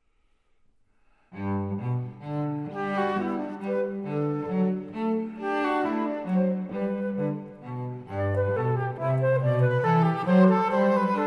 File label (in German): Duett G-Dur